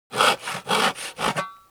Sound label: hand saw tool on wood